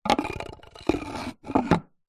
household swimming pool skimmer cover stone tile slab lift remove then put on